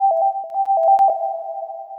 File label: ding; SFML